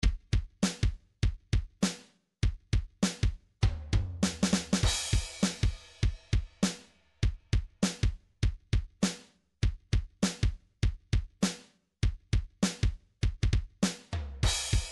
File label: midi drums hip hop